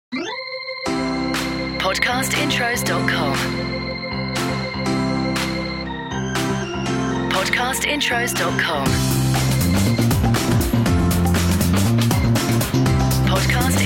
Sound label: DANCE; Podcast Watermark